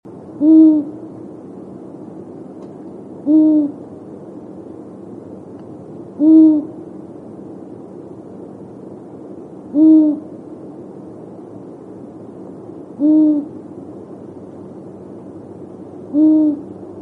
eule

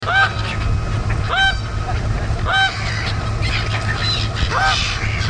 schwantoene

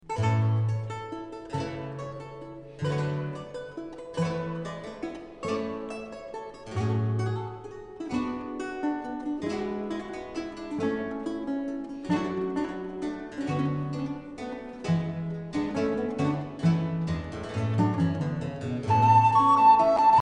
laute

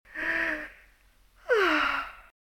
seufzer

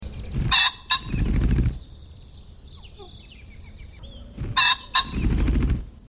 fasan

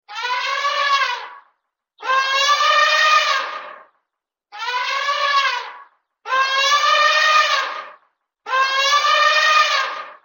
elefant

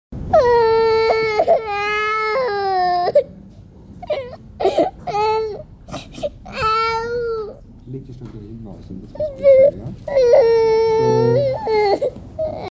weinen

